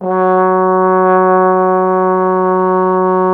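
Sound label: Brass Trombone F#0; Miroslav Vitous - Solo Instruments VOL-1; 90 s Sample CDs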